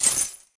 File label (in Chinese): receive gold 掉落金币